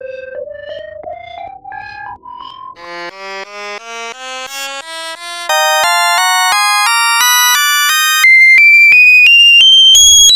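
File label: B sharp Harmonic Minor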